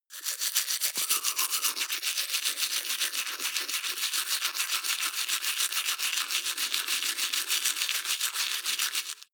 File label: teeth brush wet open front circulate